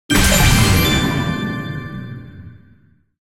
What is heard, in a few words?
roll